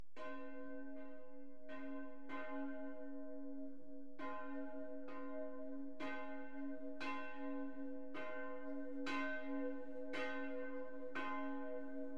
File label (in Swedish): klockor; hogerud